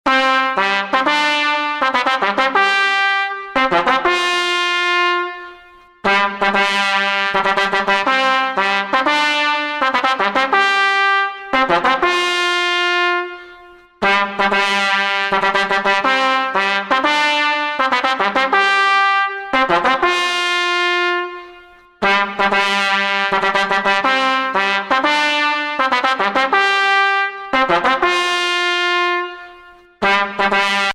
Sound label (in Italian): Tromba